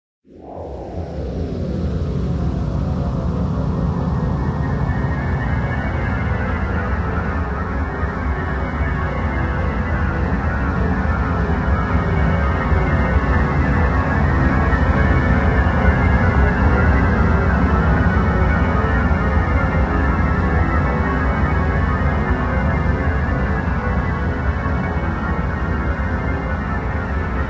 Dungeoneering Floor Track; RuneScape 3

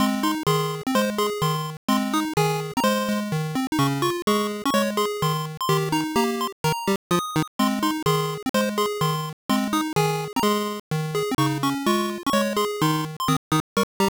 Jingle Bells; Tails and the Music Maker; Pico

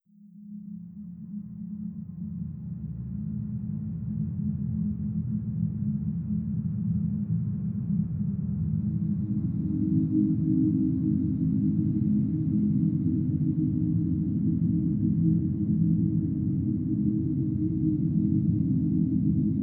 (Piano-Less); oneshot fading memory

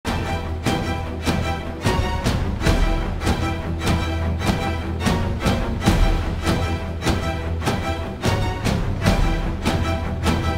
Car Chase High Action; The Amazing Spider-Man 2

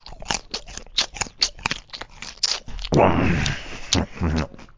pig eat; Angry Birds Space

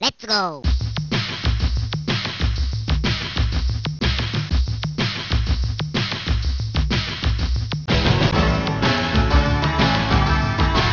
Solo

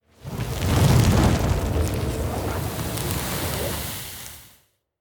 Demon Spawn

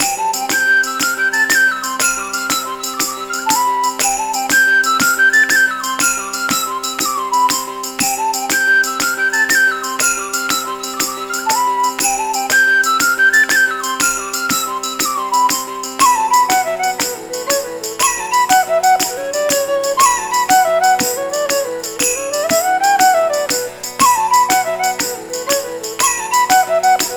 Happy Moment - Short Loop; Midas Gold Plus

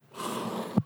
choice back left

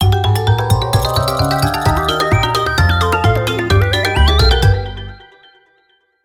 Game Win; chick chick boom chicken battle; wiiware

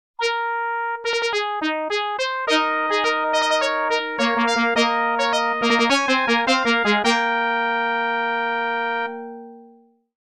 fanfaare; Marchen Veil

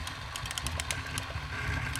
drag wood

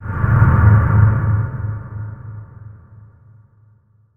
shadow activate; sakkaku

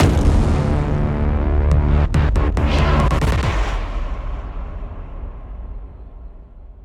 Prague West Combat Stinger; Deus Ex Mankind Divided

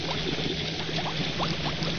Slime Beam Loop

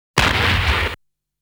Enemy Attack Sound; Saint Dragon

